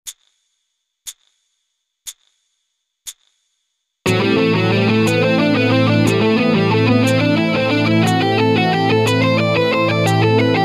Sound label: economy picking